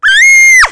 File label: shriek